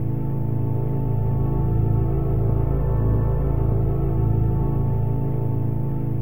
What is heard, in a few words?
organ, Synths; Notes